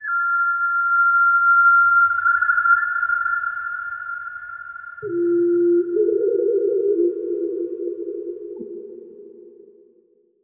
Whistles

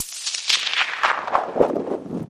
Thin Grit Sweep

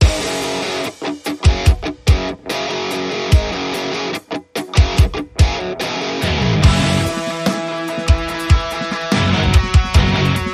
Funk With My Rock

